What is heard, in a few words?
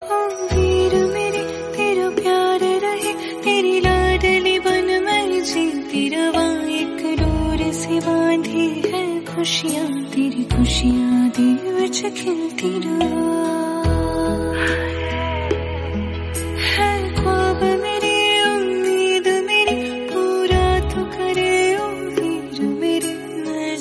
Song Female Version Ringtone